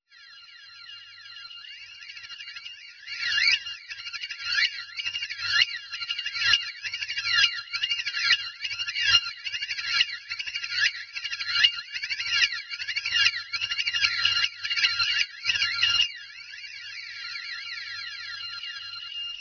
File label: Synthe Griffin